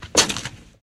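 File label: Footsteps On Wood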